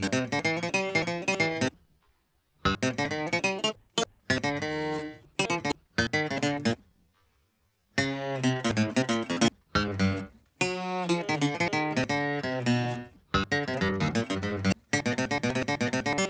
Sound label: guitar; Audio Analogies